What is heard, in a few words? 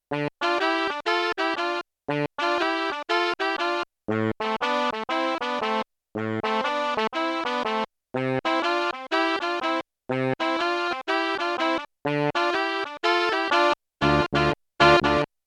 Alto & Soprano, Yamaha TX16W